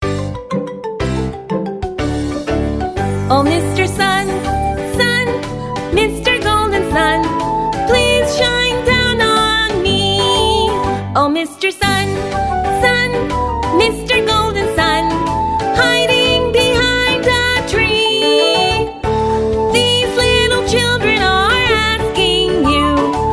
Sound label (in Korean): 영어 여 동요